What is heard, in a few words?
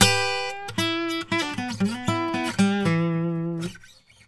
Blues Guitar; ILIO; Fretworks; LICK